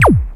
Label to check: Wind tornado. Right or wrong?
Wrong